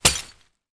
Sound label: impact glass x2 broken bottles drop in plastic bag; zapsplat